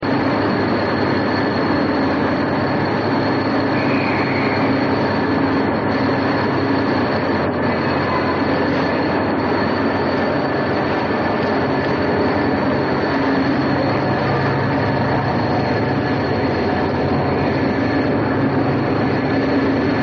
Amb Station

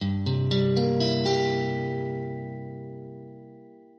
C7

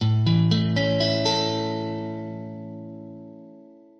A maj

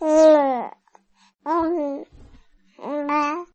Nursery Baby Awake

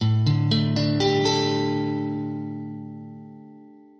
D sus4

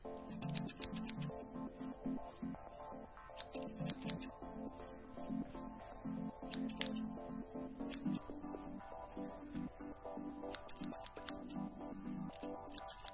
Amb Mall Salon

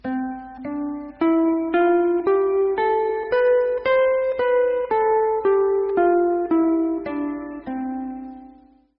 Guitar Scale

